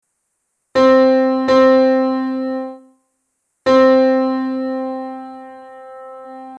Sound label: unison